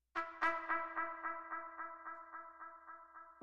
Brass

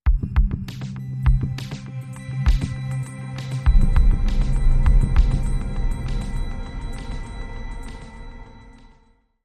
Beat Sting

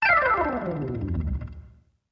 Organ Gliss Down

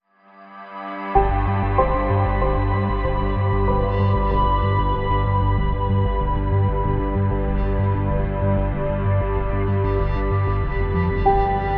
Inspiring Bumper

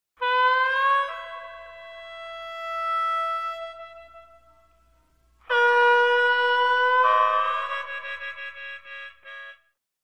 Harp

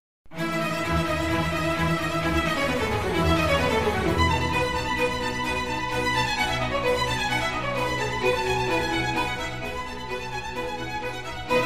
Symphony for Strings in E major; RV131; Antonio Vivaldi

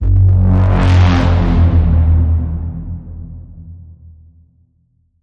Bend, F sharp

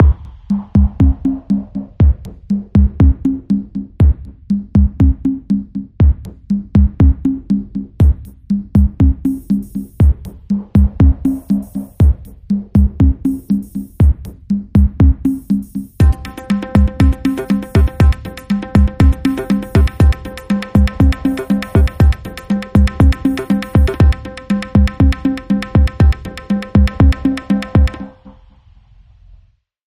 Dark Suspense, Percussion Only

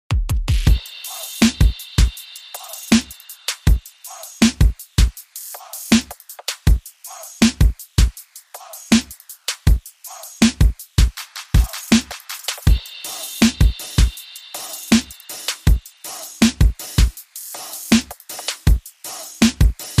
Beat

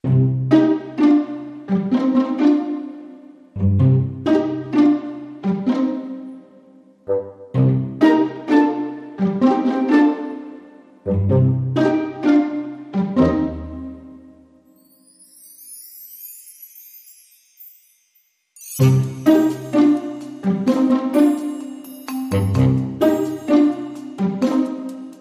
No Drum Kit No Woodwind Leads

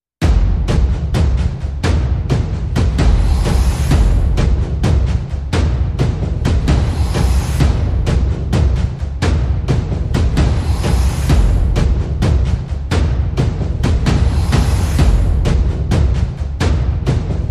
Perc And FX Only

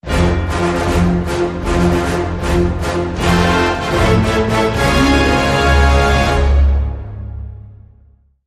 Triumphant Hero

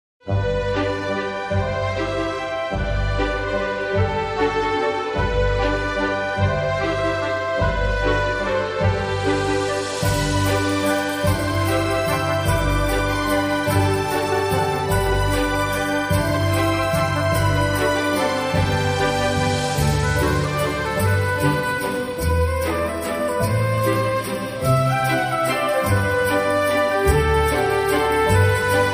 Orchestral

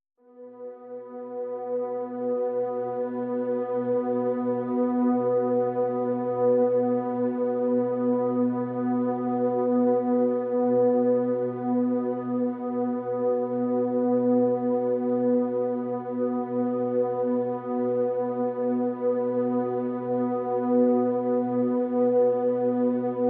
Pads; Ethereal Mist (B)

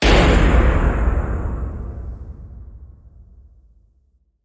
Hit Big Loud Bang

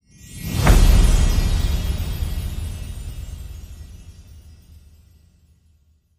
Magic Cheer Sparkles Hit

